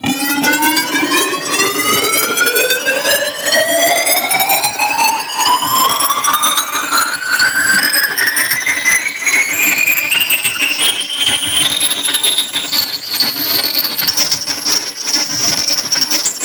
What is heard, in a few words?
Riser; neuro; InsideInfo